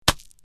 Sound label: Clic